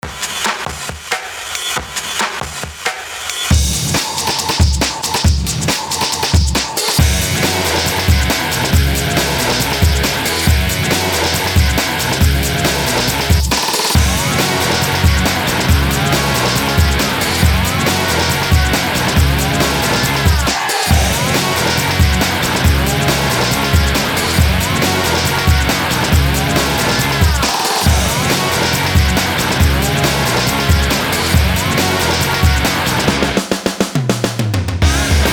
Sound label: Rock; Extreme Wake Boarding; Ho Down